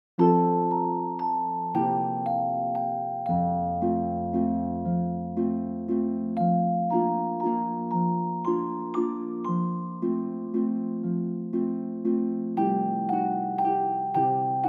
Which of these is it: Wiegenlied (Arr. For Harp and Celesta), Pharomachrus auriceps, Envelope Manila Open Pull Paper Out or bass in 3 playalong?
Wiegenlied (Arr. For Harp and Celesta)